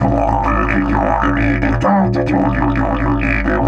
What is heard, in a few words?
130, Didgeridoo